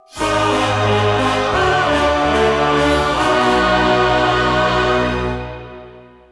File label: Goal Fanfare; crash bandicoot series sound box; 3rd Place